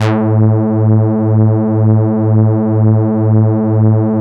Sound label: Moog Taurus, A2; Big Fish Audio - First Call Vintage Keyboards